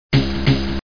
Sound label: fast door